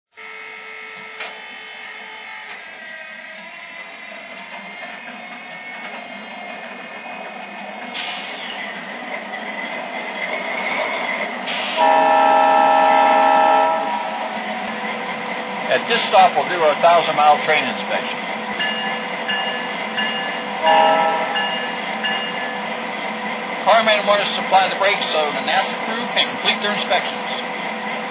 dash8; nasa; ns

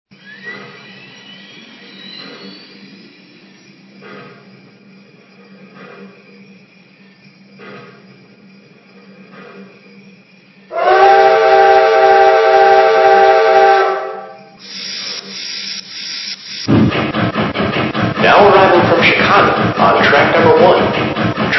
462 Pacific

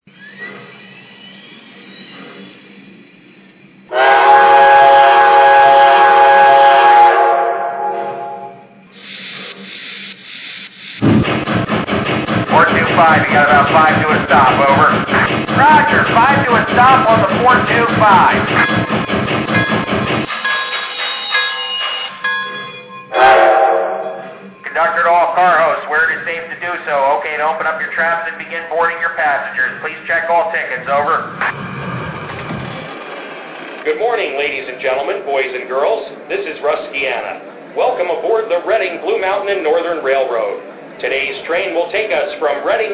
Pacific

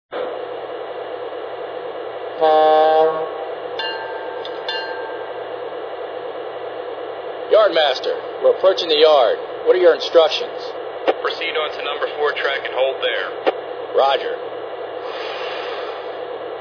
p5a box

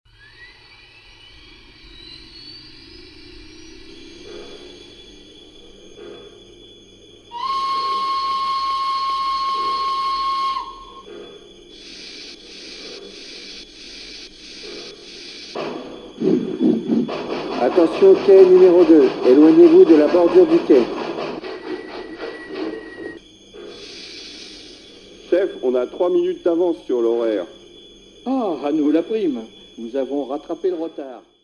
Mikado

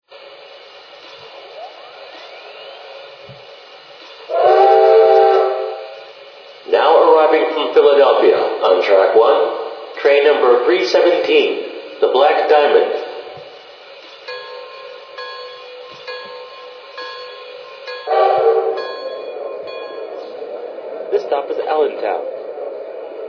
t1, trains; RDG

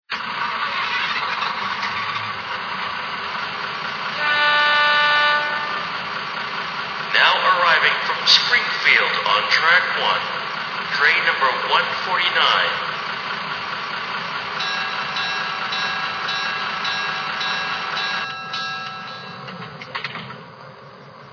Budd, trains; amt

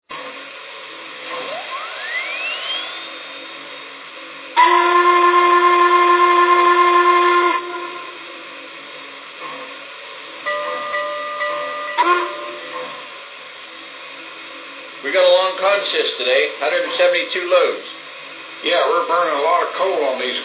Y6b, trains; N&W

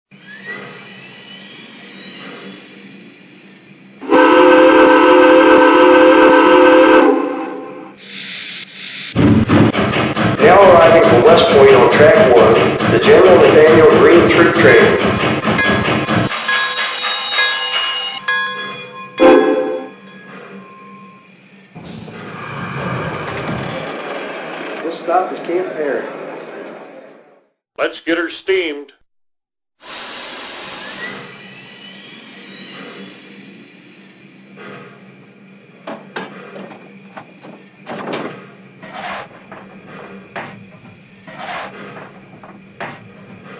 482 USRA

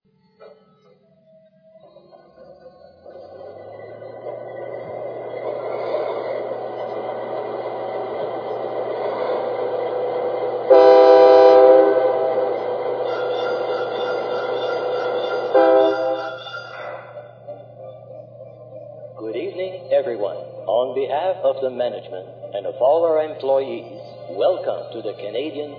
dash8; cp

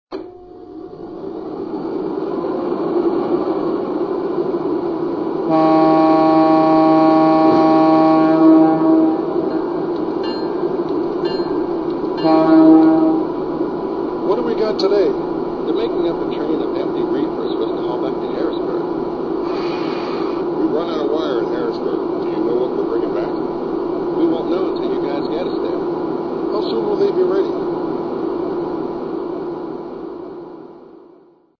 GG1

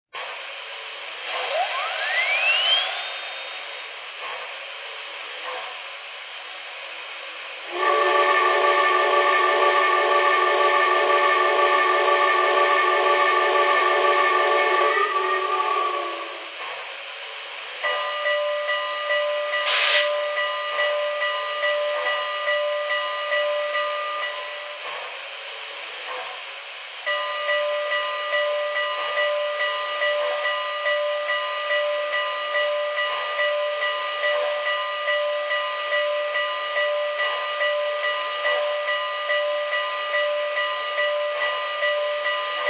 bigboy

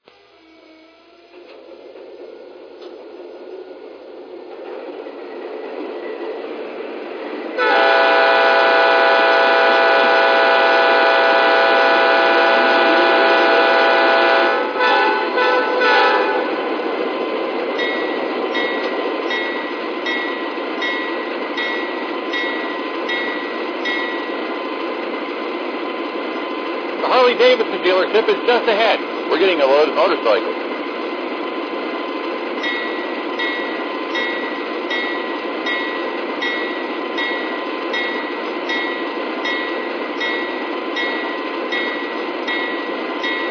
es44ac, trains

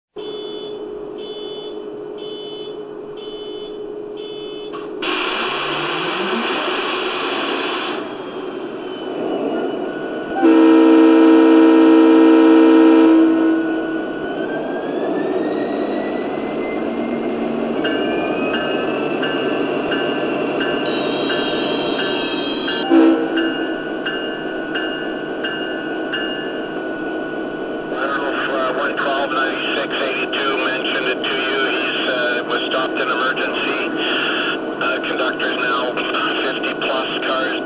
SD9043M

